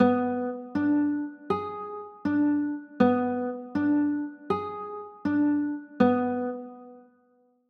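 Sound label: G sharp dim chord, guitar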